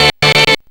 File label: GATE VOX L; Big Beat Samples & More; AKAI; VOX FX1 KIT